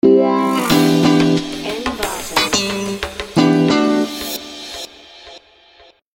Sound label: stinger summer holiday